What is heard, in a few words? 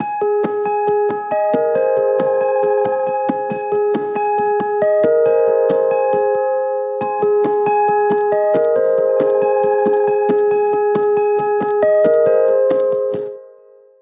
system ringtones; Amazon; Fire-Phone